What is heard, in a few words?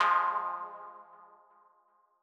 Mono DBeat F3; KG MegaSaur